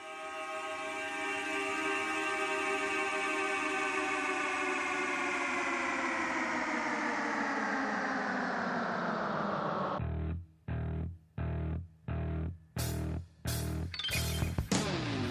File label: alert, buildup